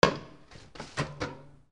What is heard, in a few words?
FALLING